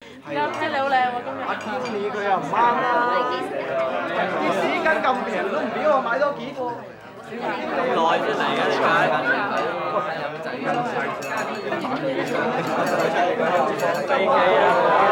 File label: Bar People Talking Atmo